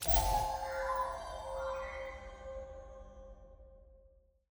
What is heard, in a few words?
ui petal button click; spirit blossom